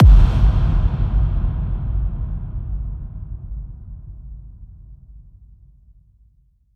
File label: Stomp